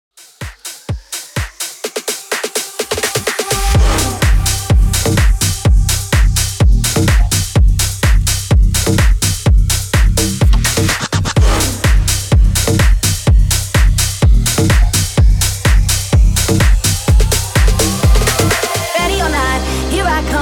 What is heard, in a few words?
cut 126 bpm